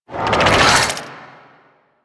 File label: Tendrils Cast; Warframe; Warframes